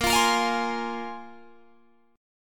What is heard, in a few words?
Bb 7th Suspended 2nd Bb, Guitar Drop D; 20,20,20,22,21,20